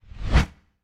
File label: WHOOSH Wide Deep Slow; stereo; Universal Sound FX; WHOOSHES